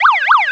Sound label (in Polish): syrena